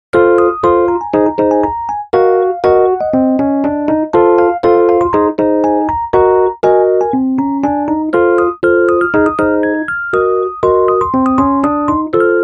E-Piano

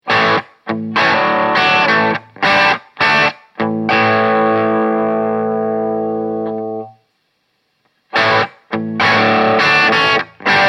Blues 3 Tube Stages 1 5

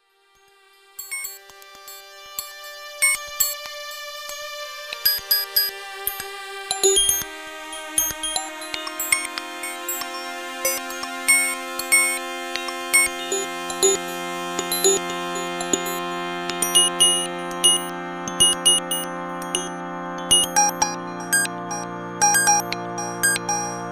ps3100 sequ

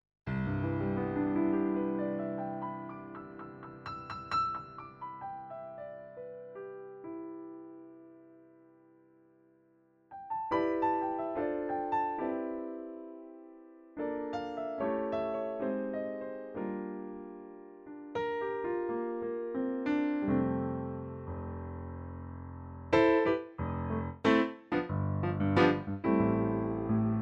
Artis SE Piano

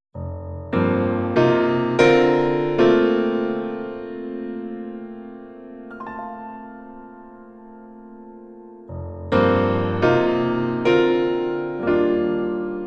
Piano solo

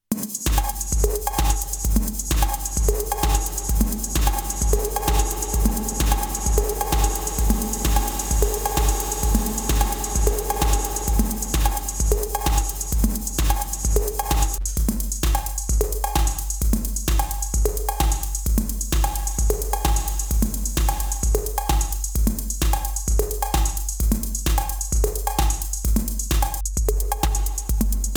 Hall Room Reverb Saturated Reverb; Erica Synths Black Hole DSP2

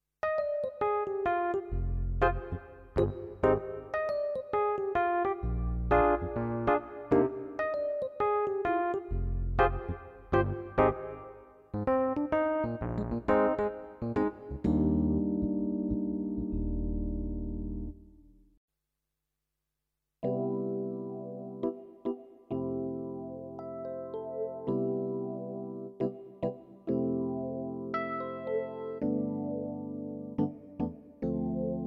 DGX650 E-Piano

